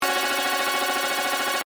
Computer Readout, Scifi, Computer, Readout, Data, System, Musical, Science, Fiction